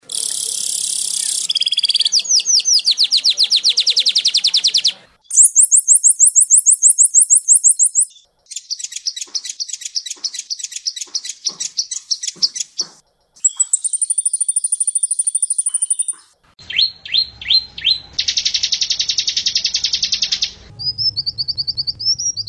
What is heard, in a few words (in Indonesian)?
kombinasi manyar kolibri ciblek